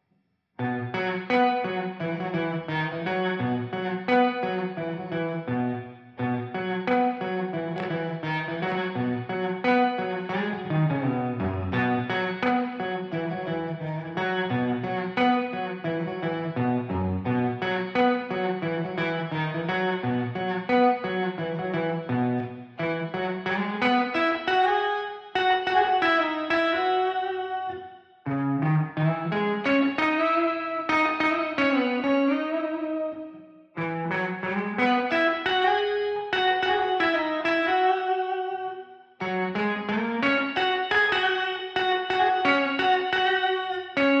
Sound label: Guitar Clean